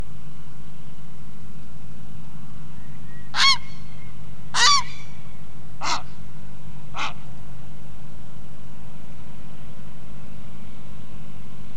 Grey Heron Ardea cinerea; Ladakhi name:Beldangyangski; Khardong

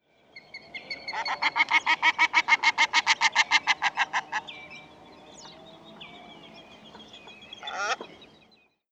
Common Shelduck Tadorna tadorna; Nubra; Ladakh

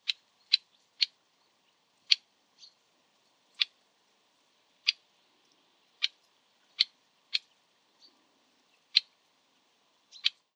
Bluethroat Luscinia svecica, Ladakh

